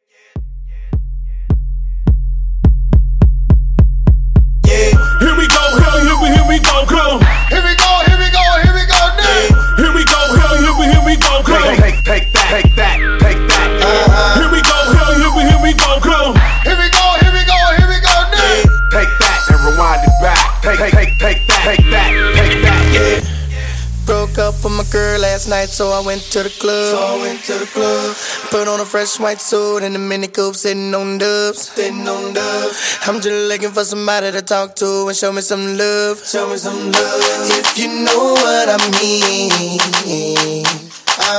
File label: Acap Intro Break 105 BPM